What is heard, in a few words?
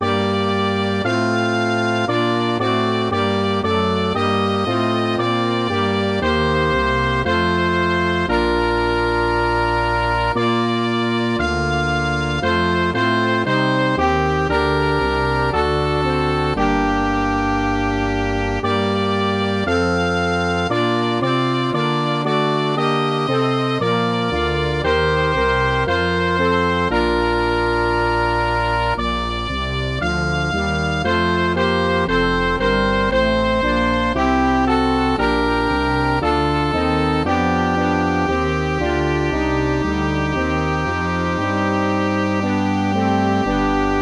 MIDI; BWV10